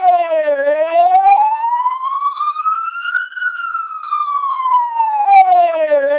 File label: twelp siren, TWELP 1200 bps